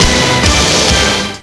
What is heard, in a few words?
Win Round; Dark; Clash